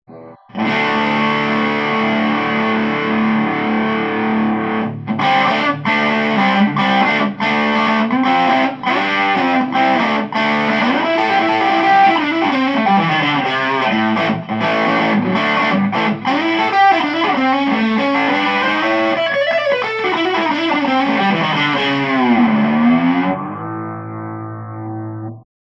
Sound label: transfet disto, guitar